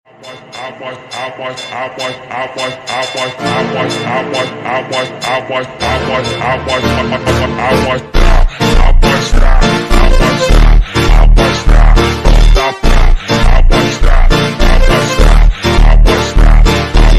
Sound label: montagem amostra tiktok slowed reverb